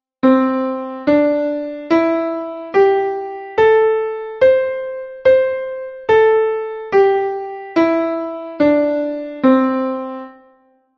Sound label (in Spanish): pentatonica, Pentatonic scale